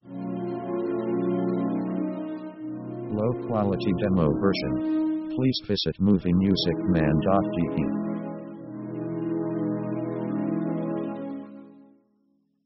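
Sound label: orchestral mystic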